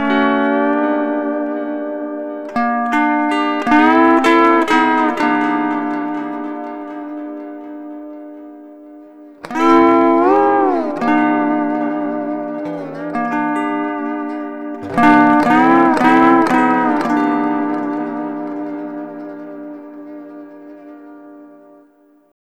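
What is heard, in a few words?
Sunrise Guitar Chord D, Lounge; Zero-G Kocktail Kollection; Construction Kitz (Lounge); Sunrise Mixes 082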